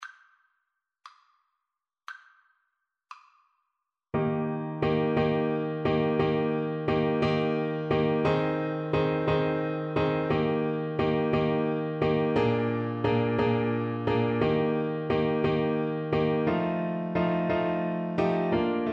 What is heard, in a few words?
over the river, Tuba